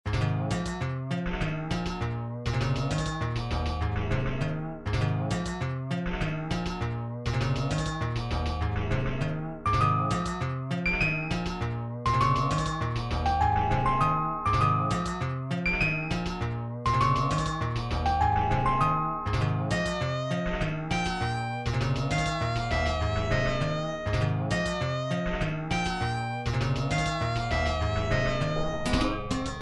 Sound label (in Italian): brano strumentale; in lingua inglese; wolfman amadeus mozart